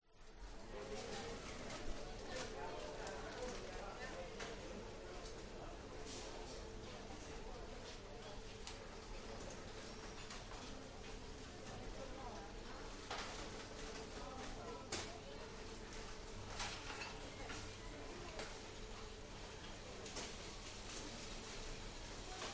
Store Department; Audio Hero

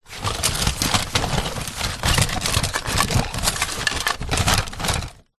leisure toys rummage box

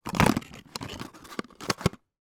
foley box lightbulb place in; zapsplat

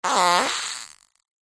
human fart accidental airy; zapsplat

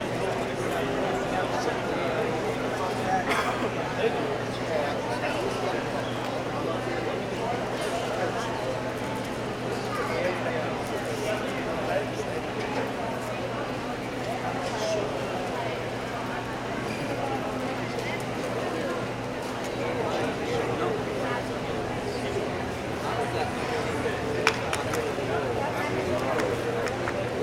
ambience airport departure lounge; jfk

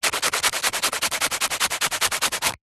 industrial sand paper wood small rubs

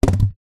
polystyrene expanded block place down on ground; foley

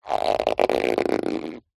foley rubber stretch; zapsplat

